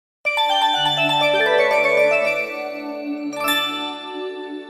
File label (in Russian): melodiya kolokolov krasivaya melodiya sms